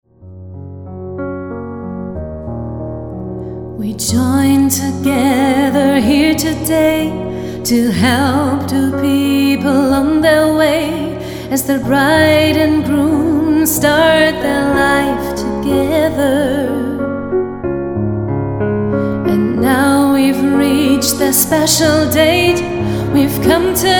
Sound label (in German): Hochzeitsversion Englisch Deutsch